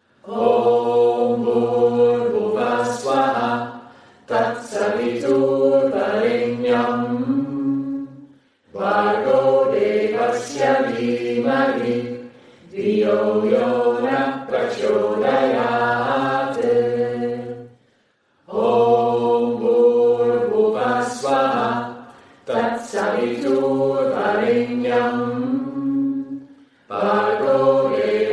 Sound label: Gayatri Mantra South Indian style